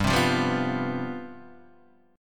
C Major 7th Suspended 4th, G, guitar chord

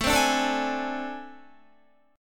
A Minor Major 7th Double Flat 5th, down, Guitar; strummed; JGuitar

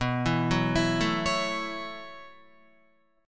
B 9th Suspended 4th down Guitar 4F,4A,5C,5G,5C,6E; B9sus4; arpeggiated; JGuitar

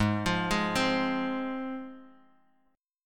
Ab 6th Ab, Guitar, Drop D

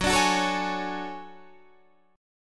D Major Sharp 11th F sharp, chord

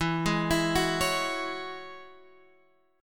E 6th Add 9th, Guitar, Drop D; chord shape

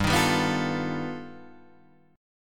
G Augmented 9th, Guitar, Open D

